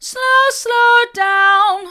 slow slow down hard, vocal

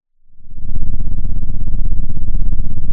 Sound of an engine revving

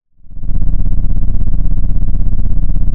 pop, Sound of an engine revving

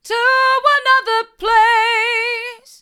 to another place hard, vocal

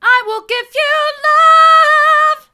hard, vocal

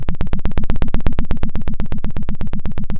Sound of a plane taking off

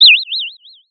Sound of a bird tweeting nicely

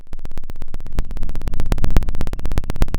pop, Sound of a fire crackling

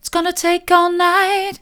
its gonna take all night soft, vocal

